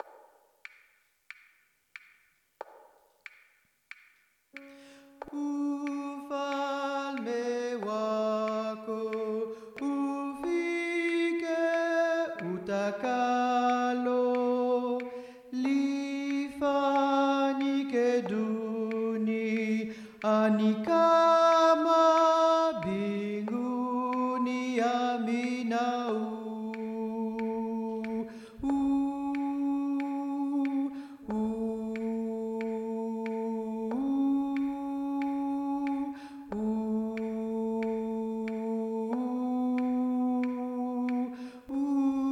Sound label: basses